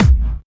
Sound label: BD Clubby; VEC1